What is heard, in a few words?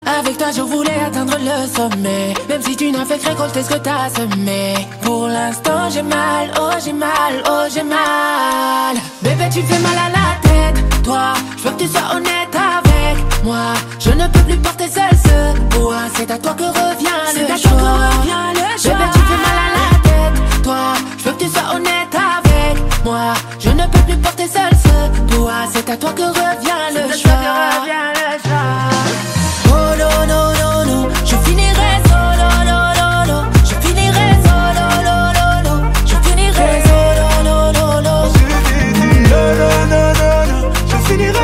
solo